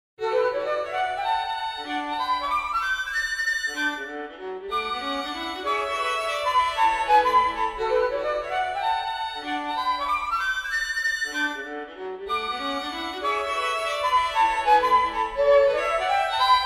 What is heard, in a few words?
Serenade Flute Violon; Cinquième mouvement : Allegro scherzando e vivace; Ludwig van Beethoven